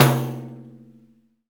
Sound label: Pandiero, Tambourines